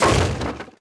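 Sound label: Wood Collapse Medium; objects; Star Wars Obi-Wan; XBOX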